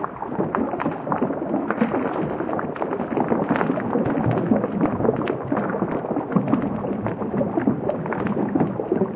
toxic slime loop, canals; video games; ambient; source engine; portal2

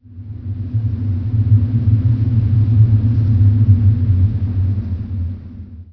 wind snippet, ambient

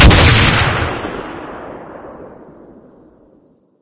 spring shoot, weapons; source engine; dod

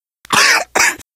cough, survivor voice

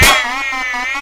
sentry damage, weapons; tf

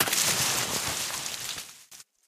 foliage, footsteps, survivor, run; player; source engine; left 4 dead 2

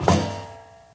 metal grate, footsteps

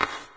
sports default sound; windows 9x; sound schemes

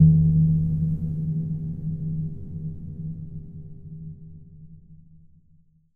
editor blips; Source Engine; Portal 2

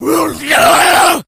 rage, infected, male

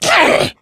pain short, voice; video game; source engine; left 4 dead 2; jockey

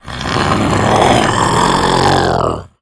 hunter stalk, idle; player; source engine; left 4 dead 2

